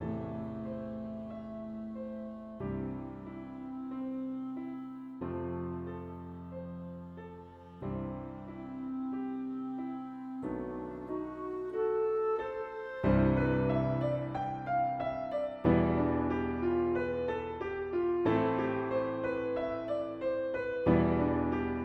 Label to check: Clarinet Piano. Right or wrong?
Right